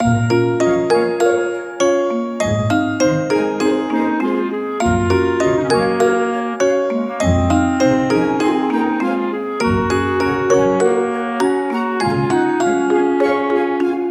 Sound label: Nocturnal Bloom loop, BGM